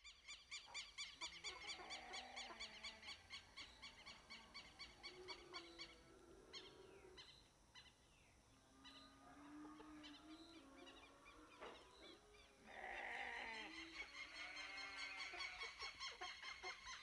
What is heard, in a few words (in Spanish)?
AMB EXT AMANECER CAMPO PUEBLO Piedra Sola UY ovejas vacas gallos perros teros